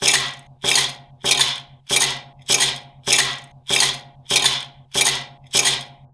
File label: armor footstep